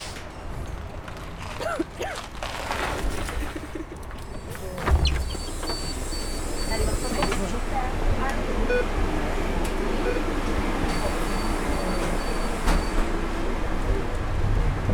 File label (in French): bus, place leclerc